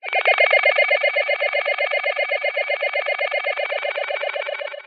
science fiction radio static interference stuttering lost signal; zapsplat